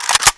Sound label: ak47 bolt pull; czero opforce